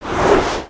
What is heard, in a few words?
Text Whip By; aronwk collection; ndaudio